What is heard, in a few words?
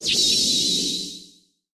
shakin